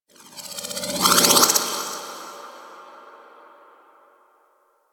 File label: Sculpture Movement Legs; Mountain Pass; Warframe; Ambience; The War Within